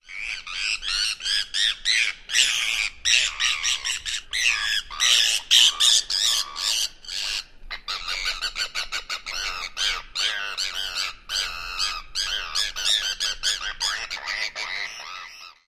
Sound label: more calls; AK